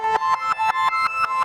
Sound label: RVRS